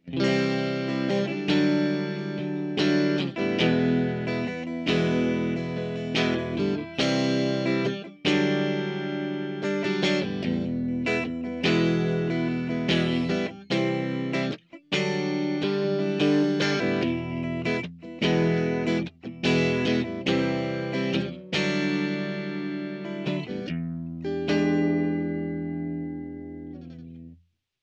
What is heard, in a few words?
Vox AmPlug Chorus